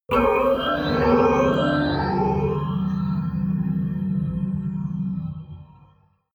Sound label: hydraulic howl